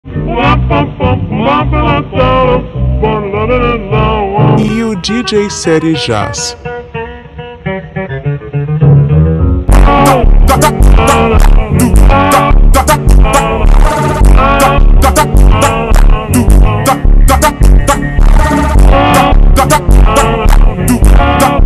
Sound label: funk slowed